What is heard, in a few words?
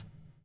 BUBL, BUBBLE; Spectrasonics - Backbeat